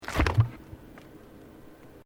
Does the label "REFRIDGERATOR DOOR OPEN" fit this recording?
Yes